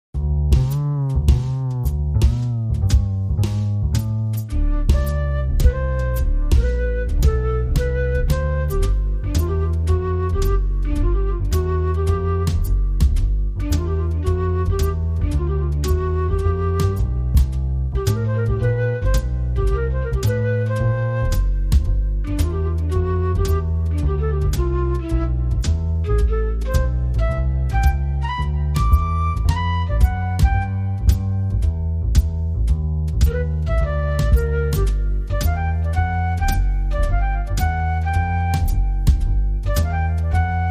Solo Flute

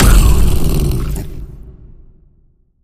Goblin Mischief Hit

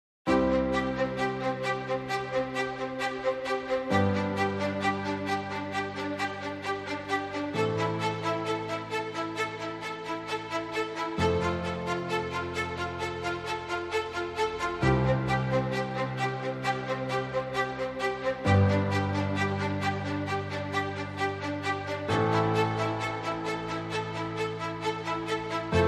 Staccato and Piano

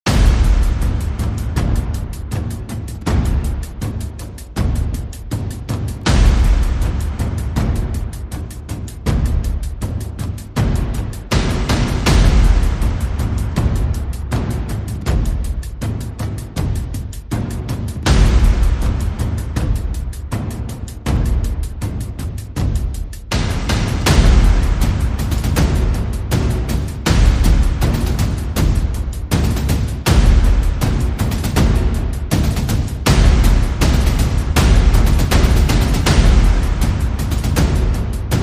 Percussion and Effects

